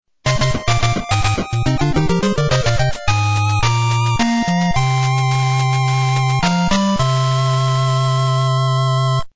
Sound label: win; solbrain; svencoop